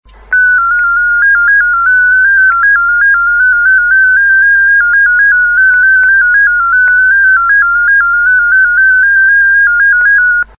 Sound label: DominoEx8, digimodes